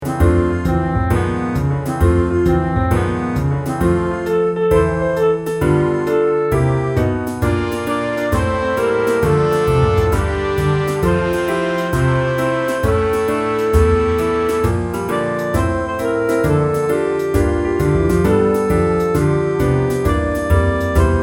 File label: jazzy